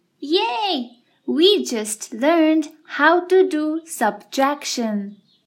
yayy; grade1; math; basic subtraction

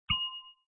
bell; grade5; reading diagrams

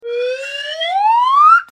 fly sound

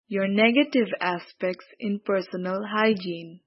negative; grade5; health; personal hygiene

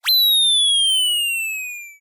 fall

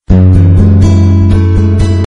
guitar

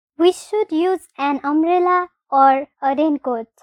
correct; weather

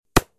hammer wood

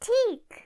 correct; income and expenditure

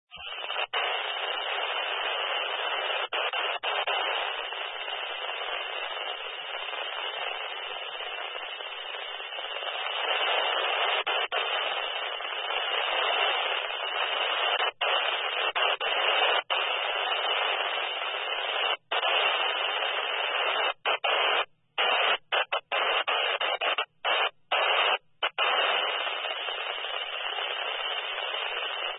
radio; grade5

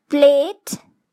plate

